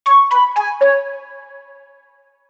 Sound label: flute sound